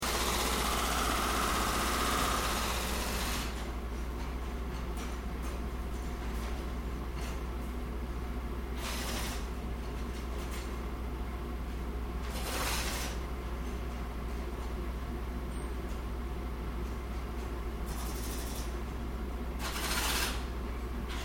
Ambience Tokyo Street Construction Siren, Heavy construction on a Tokyo street